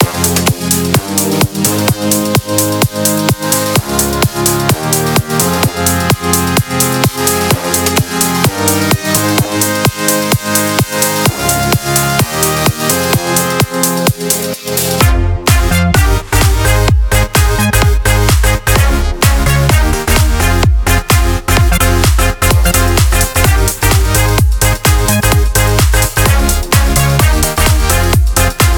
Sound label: edm